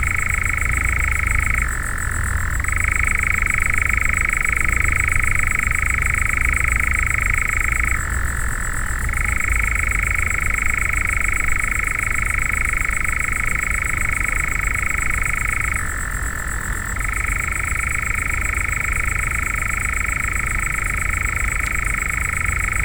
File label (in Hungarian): érdekes hangú kabóca kecskésút